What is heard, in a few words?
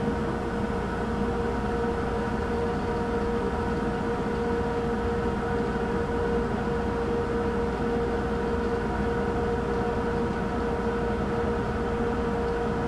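Ambient Ventilation; Among Us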